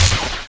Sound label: jet gun end, weapons